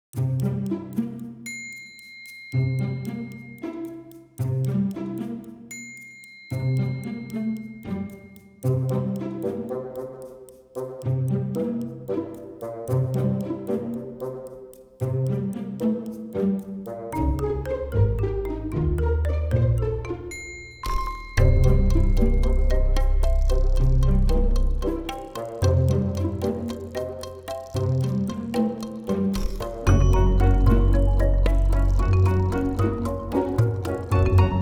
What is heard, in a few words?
Cute Robot Theme Underscore